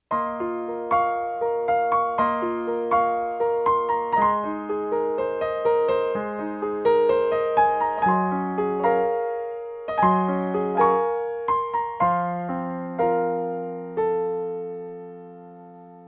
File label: music; thank you notes